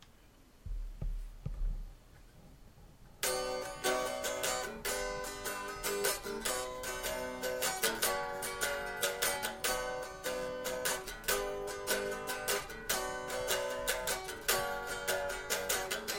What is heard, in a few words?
Godin SDX no pick no amp